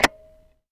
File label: Tapeplayer